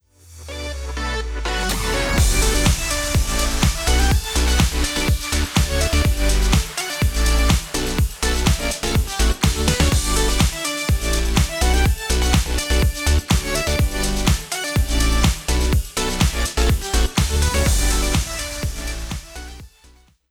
limiter master clean; oxford

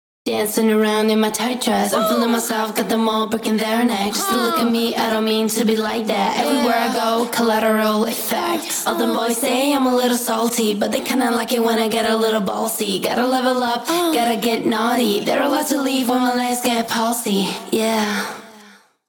vocal density off; neutron 5

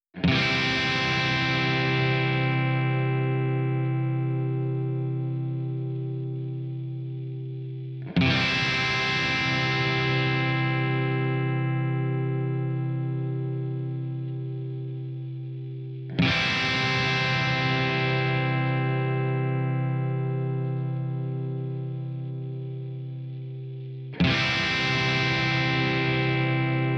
Noise Check After

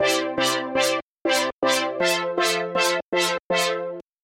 synth1 nova

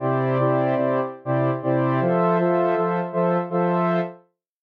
synth1 effect off

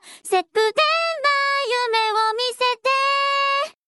vocal rider akapera

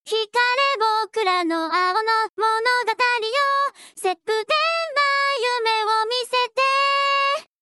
akapera

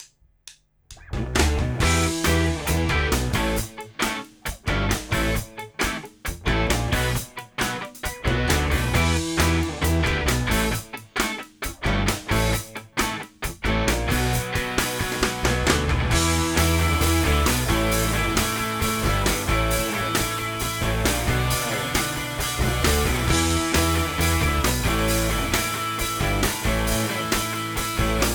neutron4 assistant on

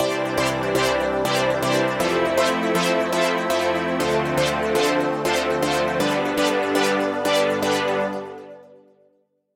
synth1 chords

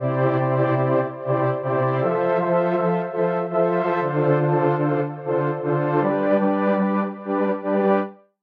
before synth; synth1

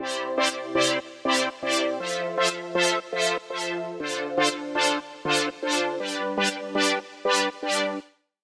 after synth; synth1

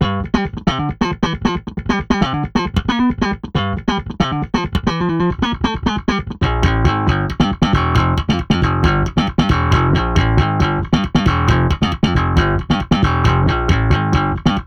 slap ghost on drum off

